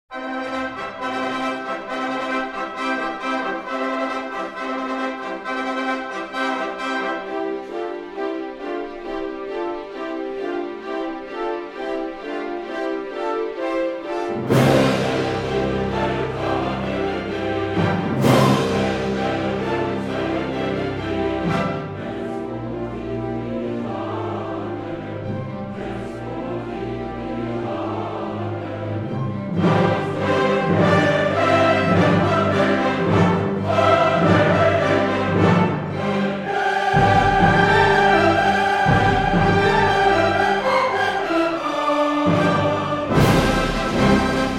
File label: Carmina Burana chorus